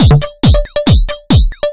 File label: drum 138, Techno drum loops; Techno ID; PS-45